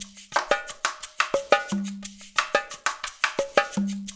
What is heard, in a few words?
percussion loop; InVision Interactive; Percussion Slam; RULE WORLD